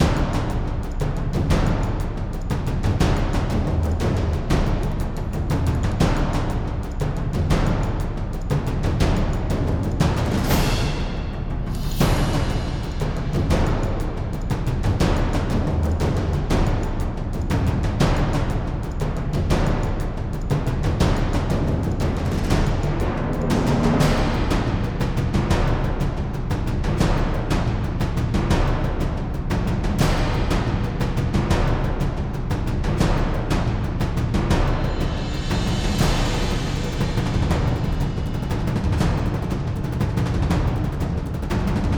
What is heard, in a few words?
Percussion Heavy; Cye 02 Gameplay 01; crush your enemies